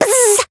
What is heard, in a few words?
Voice attack